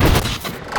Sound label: Chain Lightning Fire Ramp; Evolve; Elder Kraken